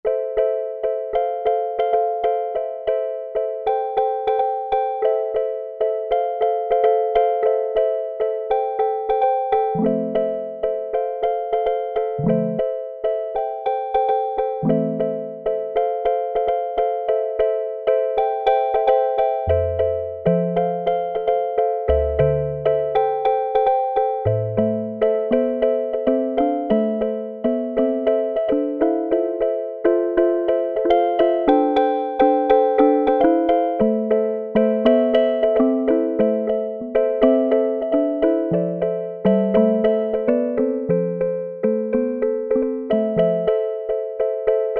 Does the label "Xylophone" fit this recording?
Yes